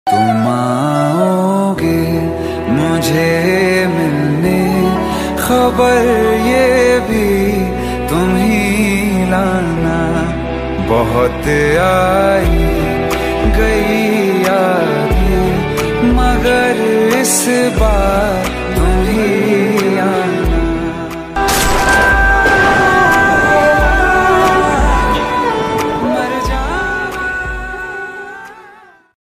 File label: Very Romantic Love Ringtone